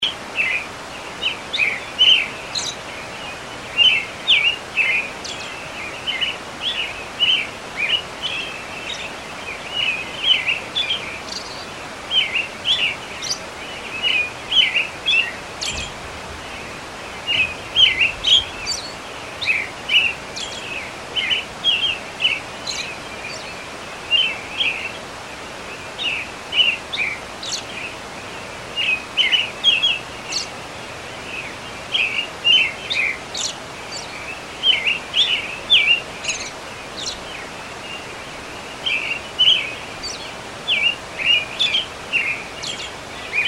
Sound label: birds and water